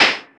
snaredrums, techno; Techno ID

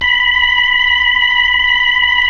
B-3; Roland LCDP10 Keys of the 60s and 70s 2; Club Ch. Xfde

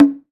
RyDrum; Planet Earth; Digital Sound Factory Planet Earth

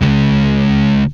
guitar stab

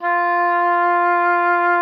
F4, OBOE; Xsample VOL-6

